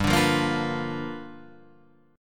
G 7th Sharp 9th G chord

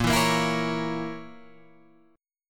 A sharp Diminished Guitar Open G, chord

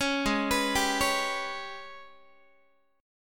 Ab Minor Major 7th Double Flat 5th Ab, Guitar; AbmM7bb5; arpeggiated; chordshape; 16,16,14,17,17,x

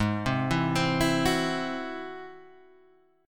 G sharp Augmented 7th G sharp Guitar Standard; G#+7 arpeggiated; 4,3,2,5,5,2; JGuitar

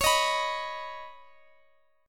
C sharp 7th Suspended 2nd, Guitar Open D

